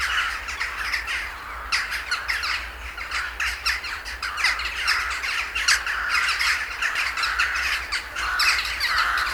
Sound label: Crows